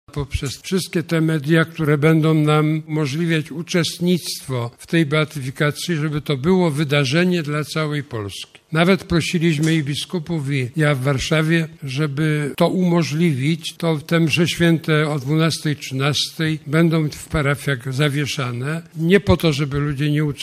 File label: beat